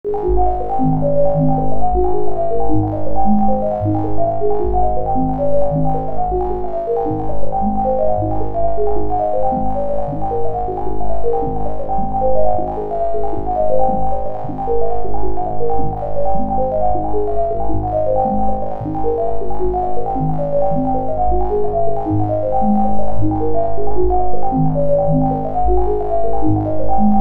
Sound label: bleep bloops